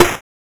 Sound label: Synth Snare